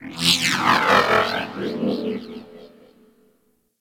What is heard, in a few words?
Robot Metallic Textures, Ambience; Venus; Objective Rooms